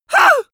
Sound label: male terrified scream short; comedy-cartoon; soundpool